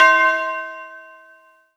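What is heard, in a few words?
Kawai K11 Tubular Bell C6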